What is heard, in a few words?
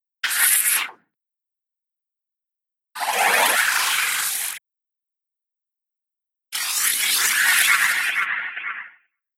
Space Zooms, whoosh; Space Zooms - Pack 1; Whoosh Sound Effects; Andrew Fleming